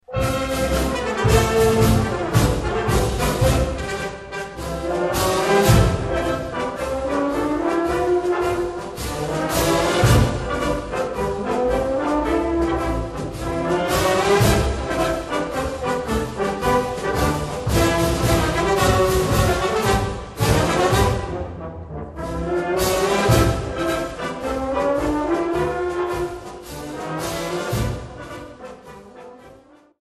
Marsch

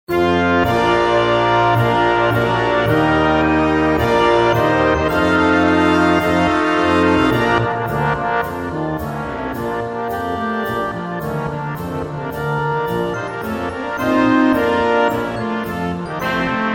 Brauerei Marsch

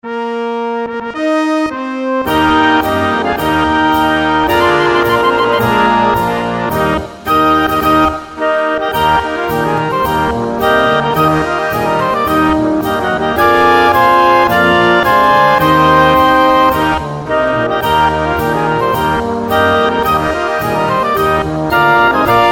Alpen Marsch

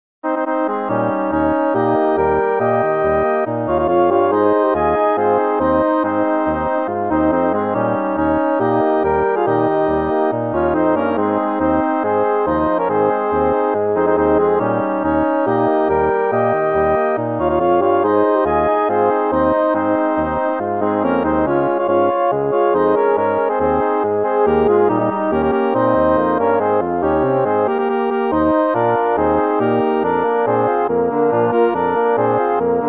Eisschuetzen Polka

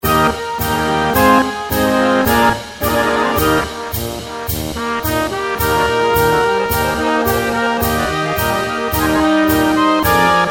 Dorflumpen Marsch

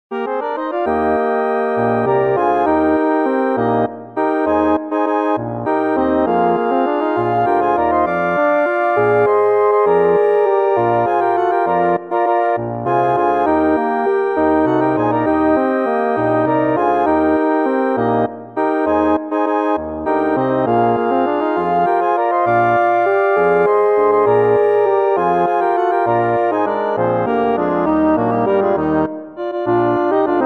Pongauer Bauernwalzer

